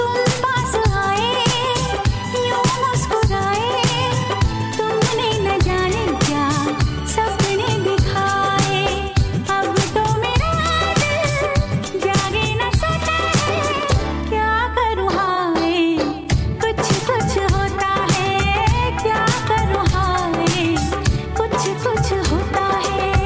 Female Ringtone

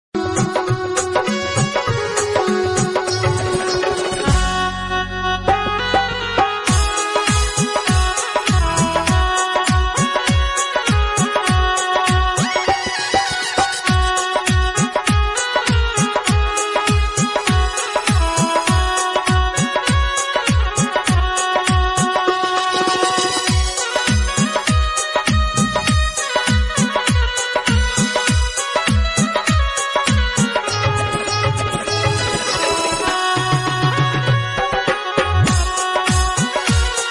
Banjo BGM Ringtone; ringtones

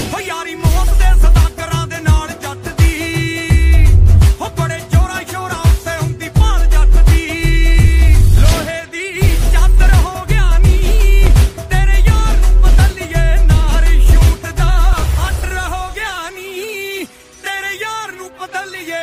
Punjabi Song Ringtone

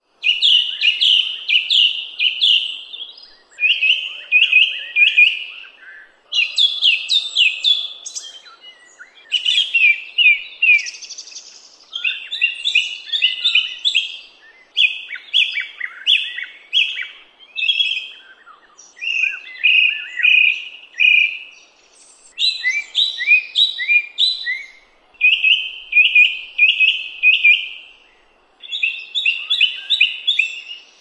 Bird Sound Ringtone; ringtones

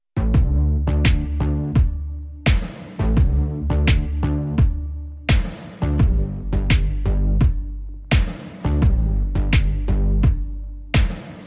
English Ringtone

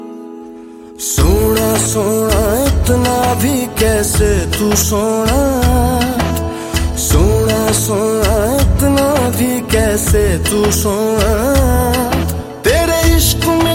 New Hindi Ringtone

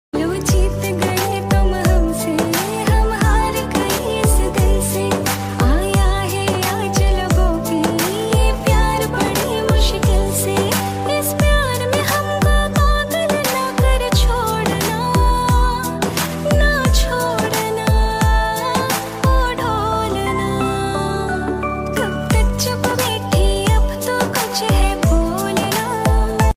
Female

